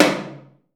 TOM 1H R, DRUMKIT

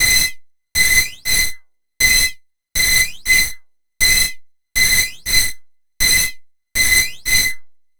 NRG SFX, Trance

